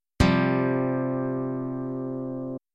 csus4; chord contruction; guitar

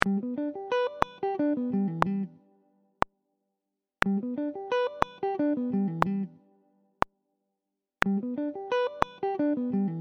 Sweep Picking Gmaj6 Slow

